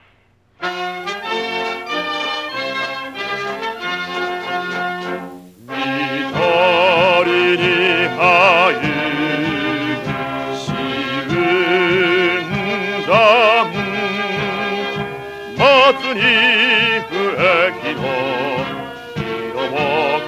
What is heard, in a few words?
song